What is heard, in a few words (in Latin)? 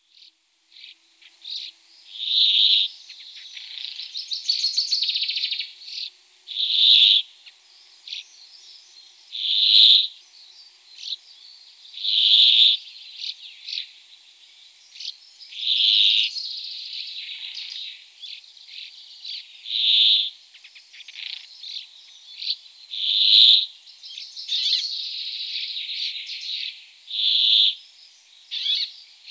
Fringilla montifringilla